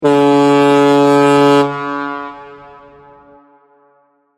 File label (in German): sms klingelton schiffshorn